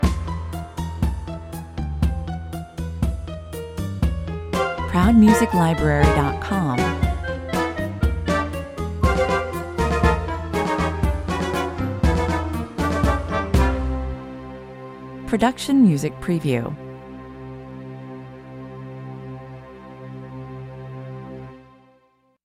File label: cascading downward 120 bpm, An upbeat, quirky and lively soundtrack with a herky-jerky, spiraling downward feeling; good for scenes depicting falling from the sky